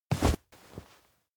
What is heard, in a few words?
shoulder bump; awayuki; anemoi